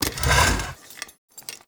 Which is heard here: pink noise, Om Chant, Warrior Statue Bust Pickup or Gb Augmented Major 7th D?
Warrior Statue Bust Pickup